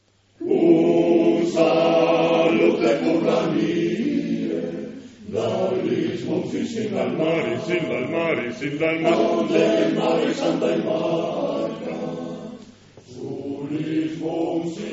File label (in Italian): coro